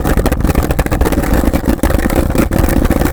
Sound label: roll brick; terrains; Tony Hawk's Project 8; XBOX